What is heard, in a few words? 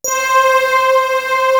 GENTLE, KORG; WS KORG#1